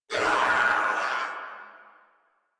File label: death crewmen; Warframe; Corpus Crewmen; Crewman B